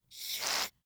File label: shaving cream